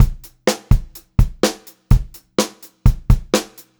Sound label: 126 Pop-Rock Drumloops